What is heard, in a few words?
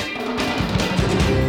drums